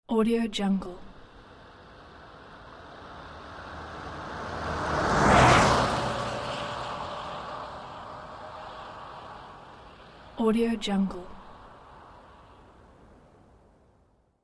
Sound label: car sped by